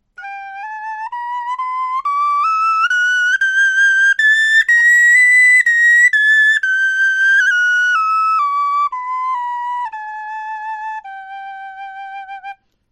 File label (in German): tonleiter